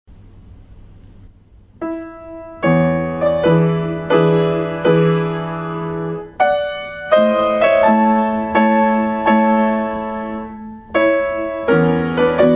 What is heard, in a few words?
Prelude In A Major; Chopin Frederic; Op. 28